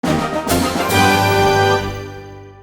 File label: Duel Minigame Results Jingle